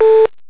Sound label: errtone